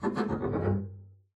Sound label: arco noise jumpy, double bass; dsmolken; noises